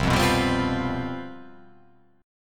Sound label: C Major 7th Suspended 4th, down